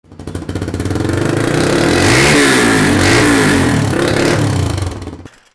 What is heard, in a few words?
VEHICLES